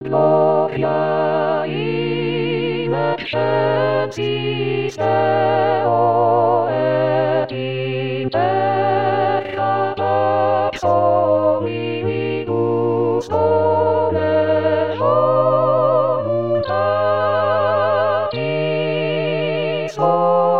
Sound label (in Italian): gloria rit coro; anno liturgico